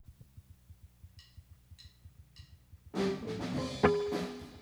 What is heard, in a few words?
guitar